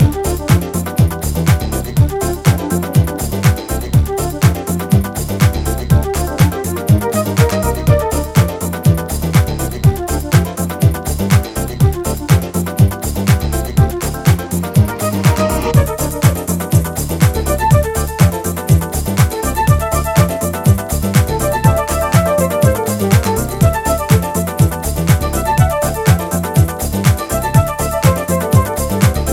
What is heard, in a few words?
Alone Flute Mix; Anorax Records